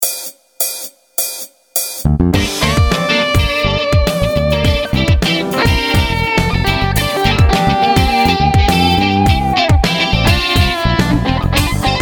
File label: G minor funk with solo; Frameworks Volume 2